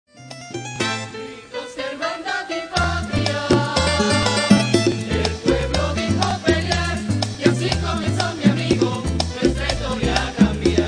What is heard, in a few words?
Salsa